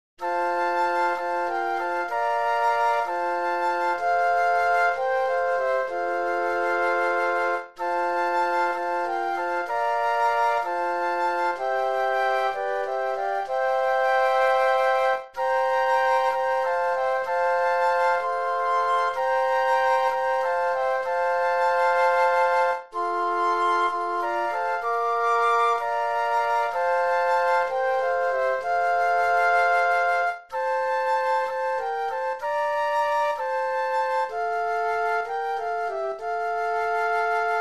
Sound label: Flute Quartet